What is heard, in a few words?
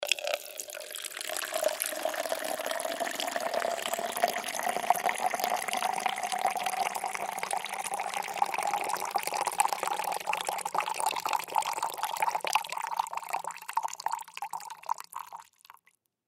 Plastic Cup Fill, Plastic Cup Fill Liquid; Materials; Household